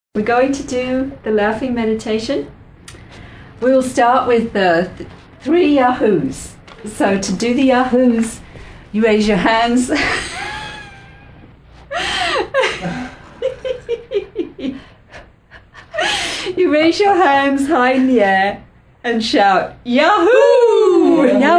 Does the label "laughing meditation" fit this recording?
Yes